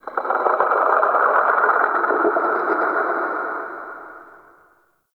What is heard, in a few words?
Texture Mid Croak; Ambience; Dungeons; Under City